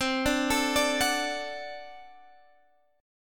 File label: D Major C, guitar